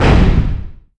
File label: metal thump; The Sum of All Fears